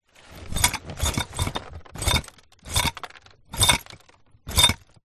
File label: broken glass in cardboard box movements; zapsplat